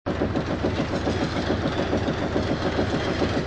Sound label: Train Move Loop